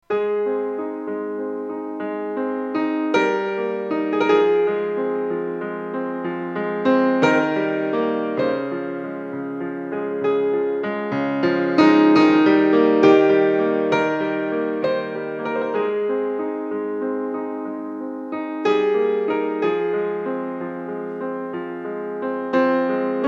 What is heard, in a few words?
Piano